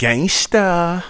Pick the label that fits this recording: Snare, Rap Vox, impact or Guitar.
Rap Vox